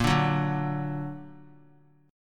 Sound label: Bb Augmented Bb; strummed; JGuitar